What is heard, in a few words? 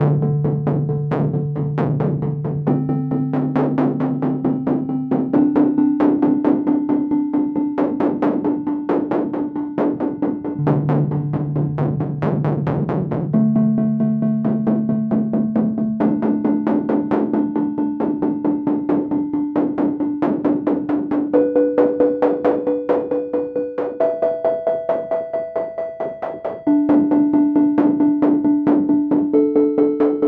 Dm90 Winternostalgie BEATPULSE